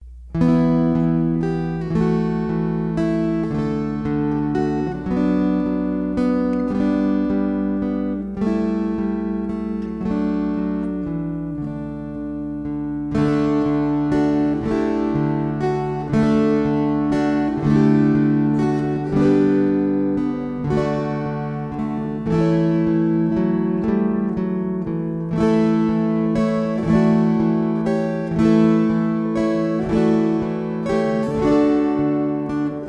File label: Waltzes